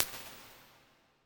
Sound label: Rhodes FX, impulse responses, warped reverb; Medium Spaces; Apple